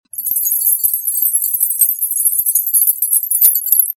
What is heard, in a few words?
ankle bells